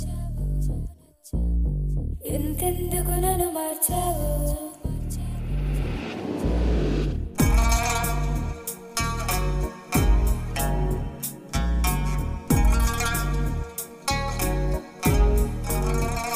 Telugu Ringtone; Yuddham

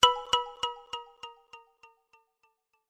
Notification Ringtone; LG G8s ThinQ

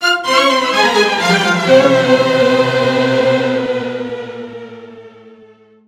Classic Text Message Ringtone

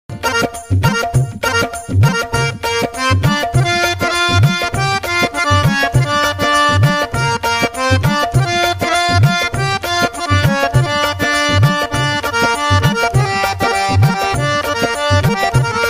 Instrumental Ringtone

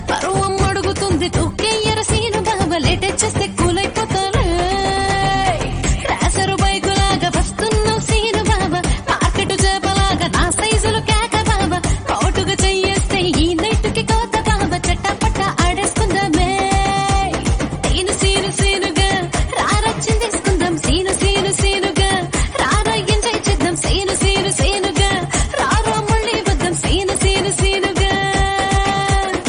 Telugu Ringtone; SakalaKala Vallabhudu

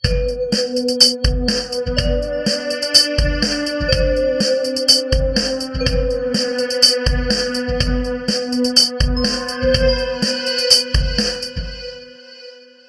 Pad Chords Ringtone

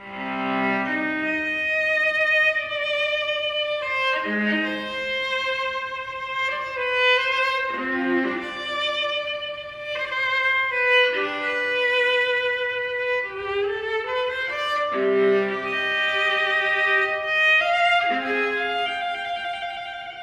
Sad Violin Ringtones for Mobile Phones

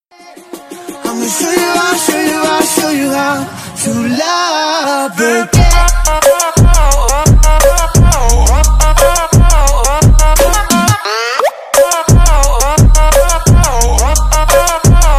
english song ringtone

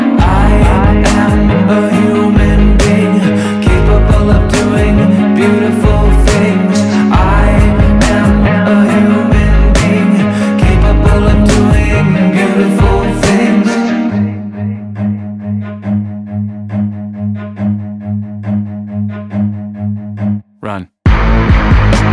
English Ringtone

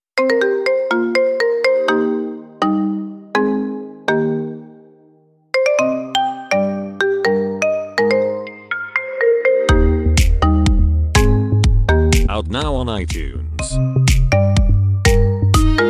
Apple iPhone 11 Symphony Ringtone

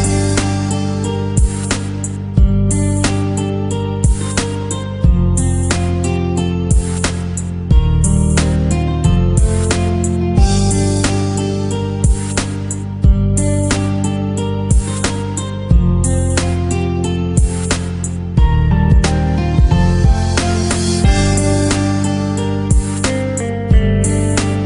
Sad Instrumental Ringtone; Samsung

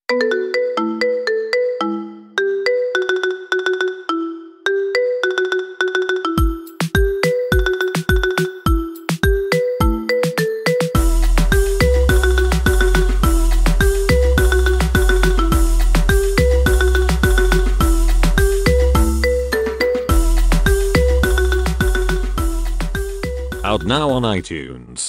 Marimba Xiaomi Mix Ringtone

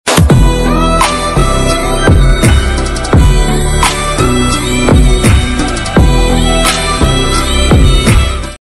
Tamil Love BGM Ringtone

